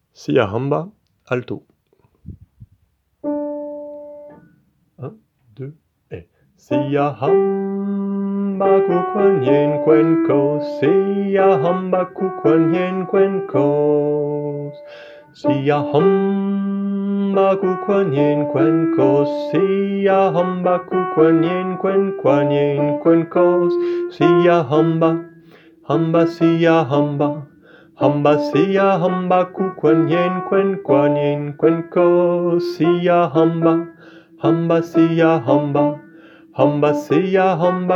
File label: Siyahamba Alto; Folklore Zoulou